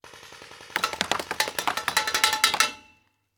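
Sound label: Paintball Gun Impact; Sound Ideas; Guns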